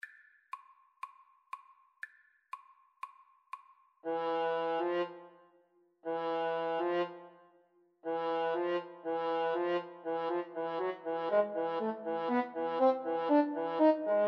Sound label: 2 horn